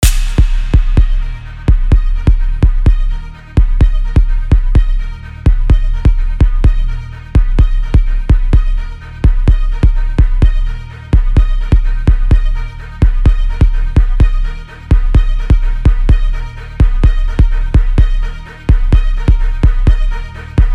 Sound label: Drum To Bass